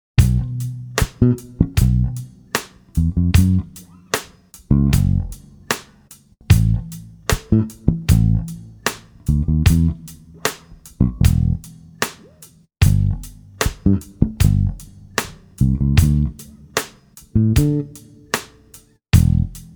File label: funk